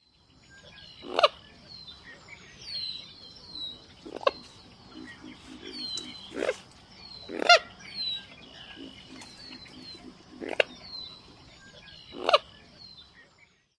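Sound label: grating calls, Common Moorhen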